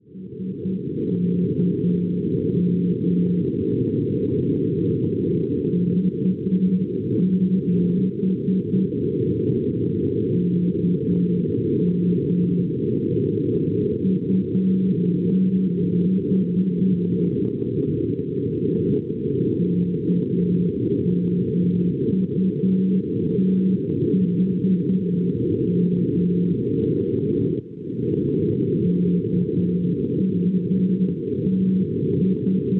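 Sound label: NDB non-directional beacon, 366 kHz; UTH; Uthaug; Norvegia; Schwarzsee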